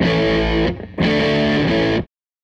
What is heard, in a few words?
Les Guitar Loop